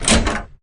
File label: door; Eustia